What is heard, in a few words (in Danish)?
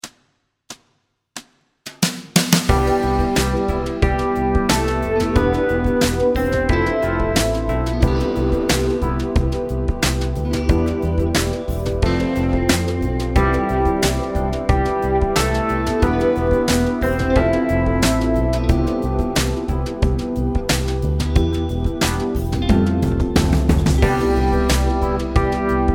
Imitation 7 toner start dyb G; In the next two bars, Imitate - repeat - the melody on your instrument.; Imitation del 1 kapitel 3 opgave 1